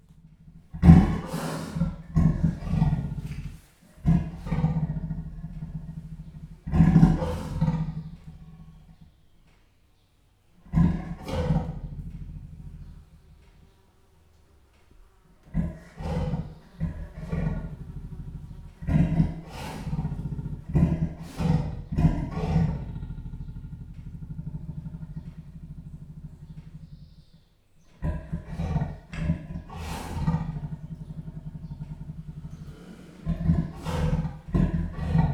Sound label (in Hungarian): horgó állat, egy léggyel fűszerezve, leopárd; jaszberenyi zoo 2015